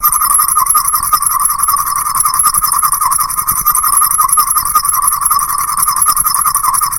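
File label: sounds of scratches on metal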